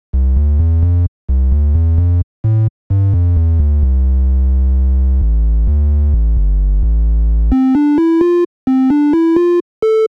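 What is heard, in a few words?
triangle synthesizer; flstudio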